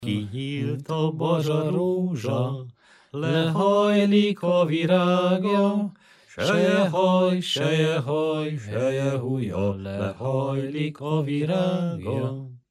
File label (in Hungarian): nepdal; kinyílt a bazsarózsa